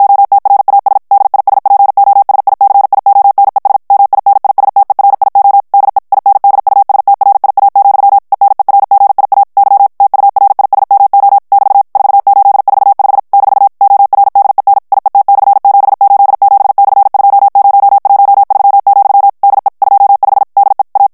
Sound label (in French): ESPAGNOL TEXTE EN CLAIR VITESSE 50 MOTS MINUTES, morse